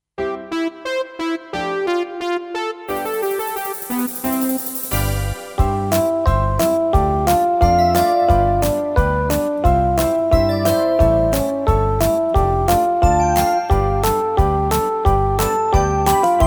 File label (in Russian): Танец с клубочками, минус